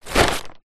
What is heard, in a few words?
tent ground sheet movement short; foley